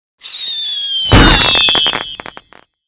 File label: fireworks